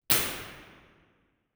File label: binaural, sound sculpture; tvisongur; iceland model